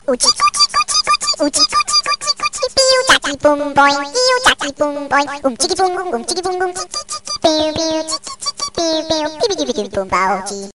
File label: Mobile Ringtone Funny Tones